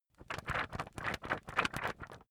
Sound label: magazine wobble